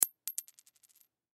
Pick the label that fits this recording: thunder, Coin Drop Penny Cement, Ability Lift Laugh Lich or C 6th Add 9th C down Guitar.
Coin Drop Penny Cement